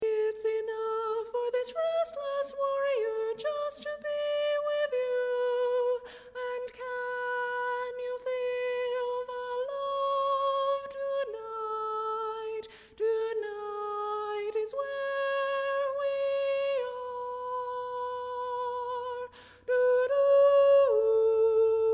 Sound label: Tenor